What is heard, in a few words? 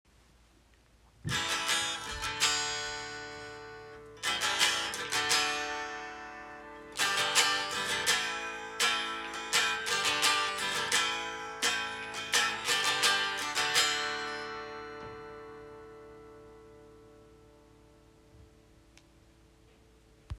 acoustic